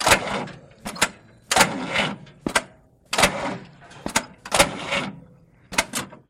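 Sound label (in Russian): звуки стартера автомобиля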